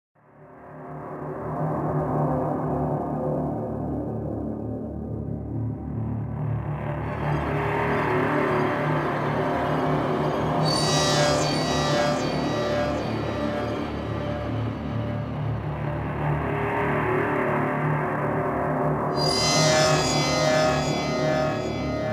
Scary Amb

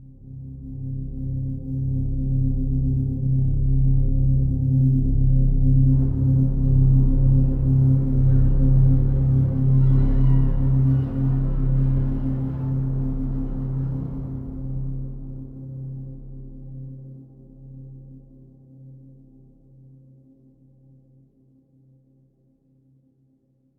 Day Amb Stinger

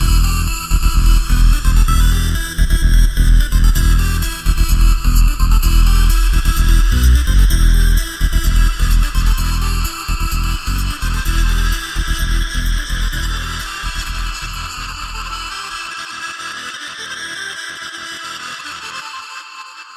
Clubstep

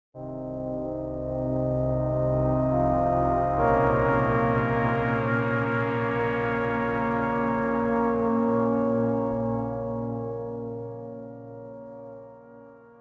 Day Amb Stinger

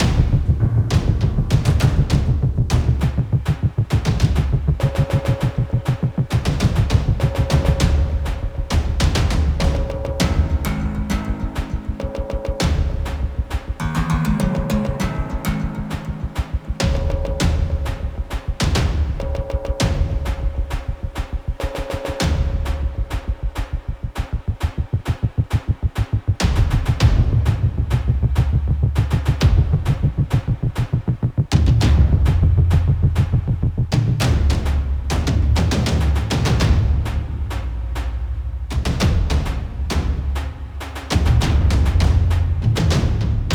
Museum Suspence

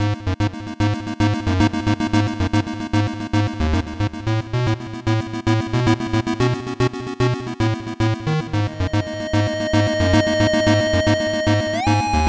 Dating Tense

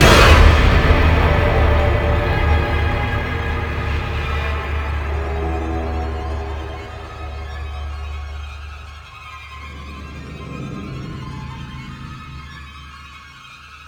Stinger

